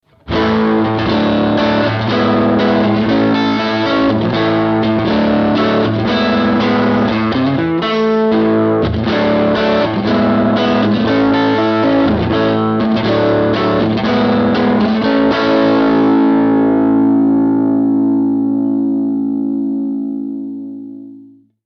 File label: neck crunch